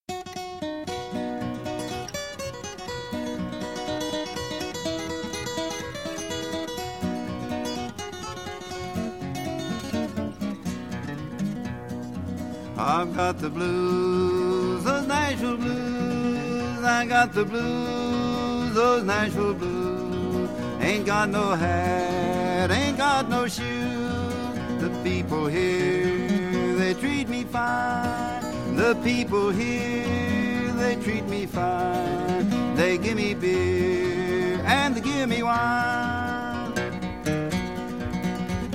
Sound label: Blues